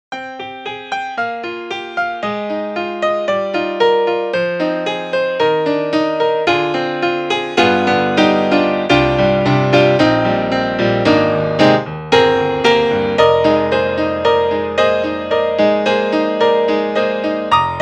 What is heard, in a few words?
Vienna Concert Grand Pop Idol; Native Instruments